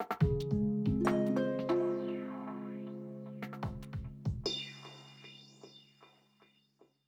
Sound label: Calm; super mario maker 2; Peaceful SFX Raws - Otoasobi Calm SE 08 edit